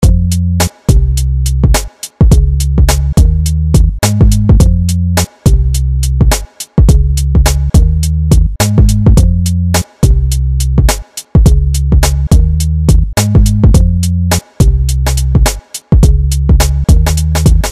beat

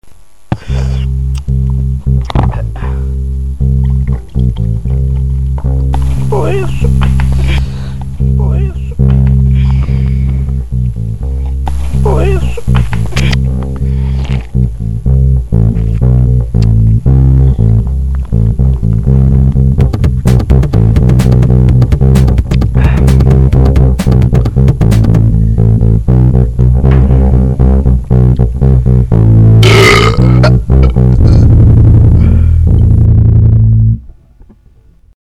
horror

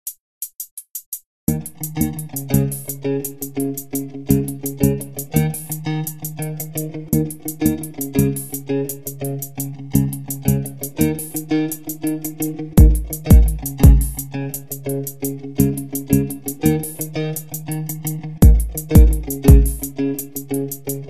guitar

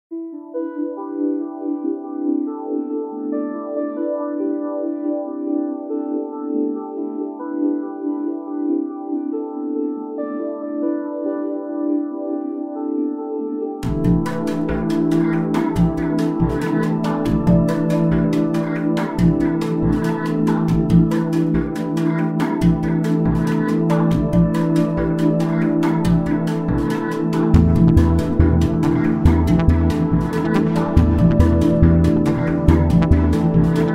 electronic lullaby

